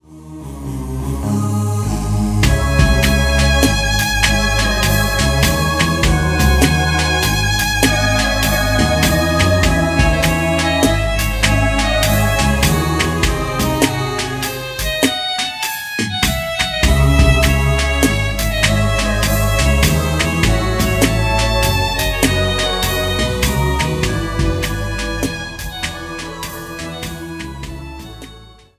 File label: violin concerto e major; bachs; bwv 1042